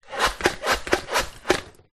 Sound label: household squeeze mop squeeze fast many